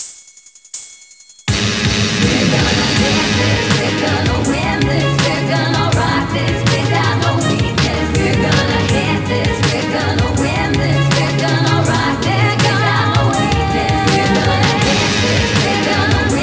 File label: Ditty; ESPN NFL Primetime 2002